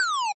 Shoot chiptone; gridtracker

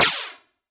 Quick burst

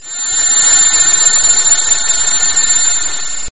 Rpg game move charging or application

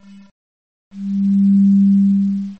Bobbing sine chiptone

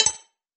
Clicky

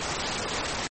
Grapple pull up chiptone

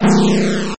Ringing laser low; gridtracker

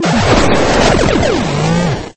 Tonal explosion; gridtracker

